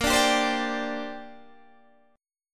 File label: A sharp 6th Add 9th, Guitar Open D